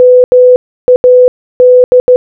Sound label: MAD Morse Code